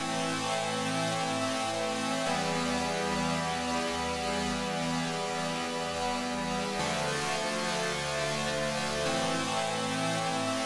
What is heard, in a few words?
chord sequence audio